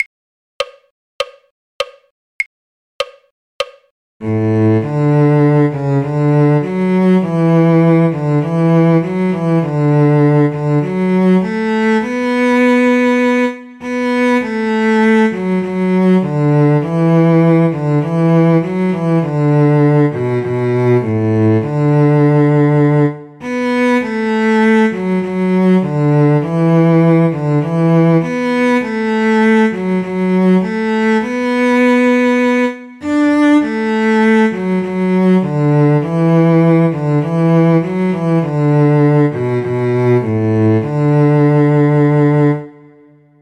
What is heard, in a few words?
cello; auld lang syne; folk